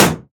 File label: Bullet Impact Light Version; Olivier Girardot; Hand Guns Sound Effects Pack